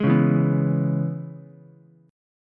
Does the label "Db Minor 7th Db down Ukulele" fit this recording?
Yes